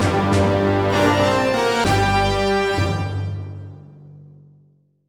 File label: Music Sting - Box Failed; Pandora's Box